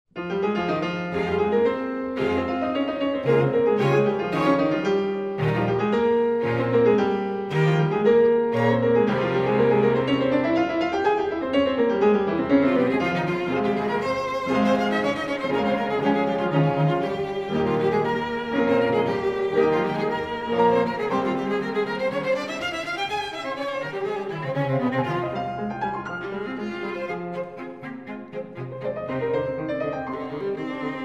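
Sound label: piano quartet; boellmann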